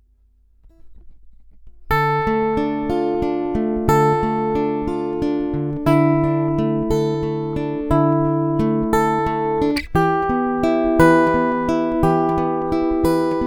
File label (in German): HarleyBenton GS Travel E Mahagoni; Harley Benton GS-Travel-E Mahogany